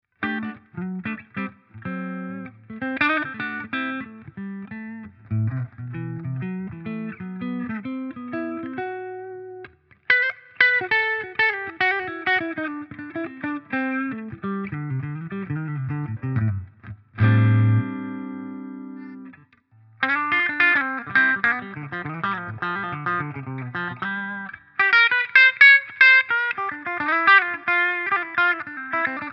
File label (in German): Solar Guitars GC1.6 Killertone clean erst Hals dann Step PU